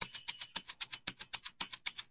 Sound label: shaker pattern, 114 bpm